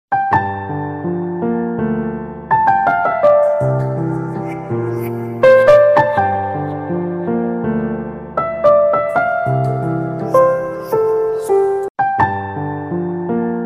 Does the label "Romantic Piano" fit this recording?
Yes